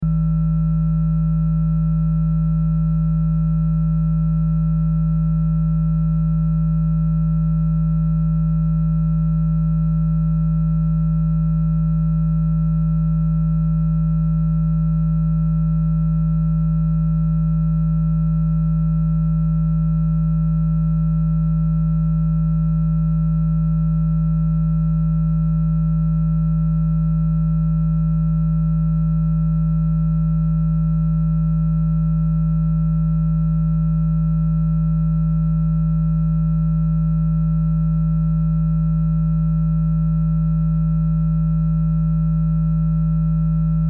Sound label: Sci Fi Force Field, Sci Fi Force Field Energy Source Constant Loop